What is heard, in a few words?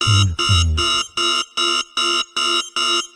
sci-fi alert